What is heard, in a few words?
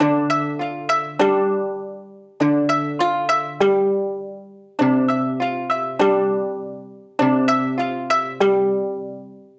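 Pastoral Lyre, A minor 100 bpm; MIDI Clips; Tonal; Motifs; Ableton; Mood Reel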